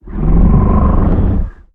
Monster

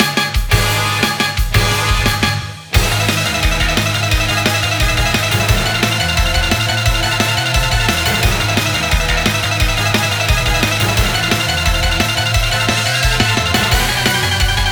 Distress, bgm

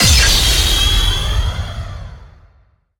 Battle

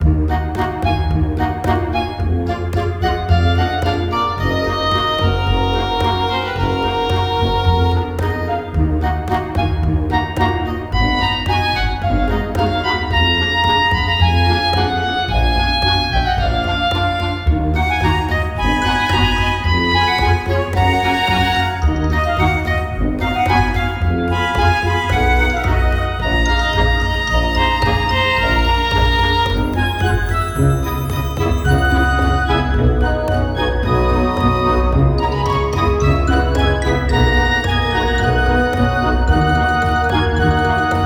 Town; bgm

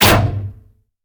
Bow

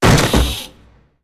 Open

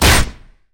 Blow

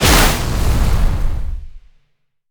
Fire

